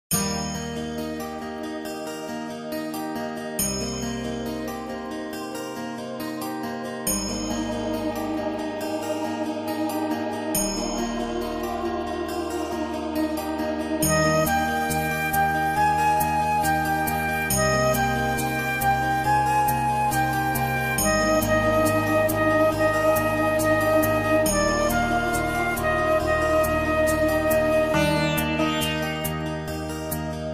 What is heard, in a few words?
bg music